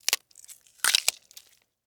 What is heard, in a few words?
damage, spider